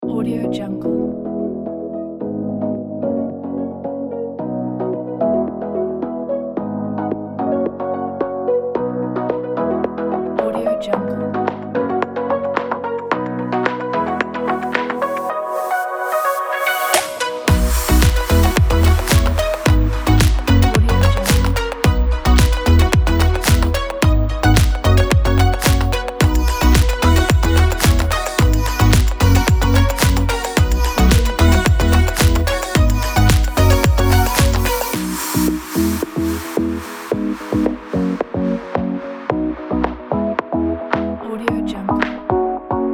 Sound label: summer fun